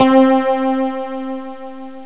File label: guitar slie